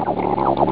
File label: Floor